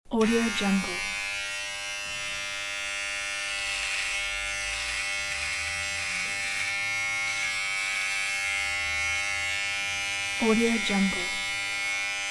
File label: electric razor